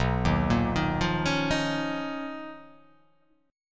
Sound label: B Major Sharp 11th